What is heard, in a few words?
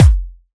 Kick; M8; Electronisounds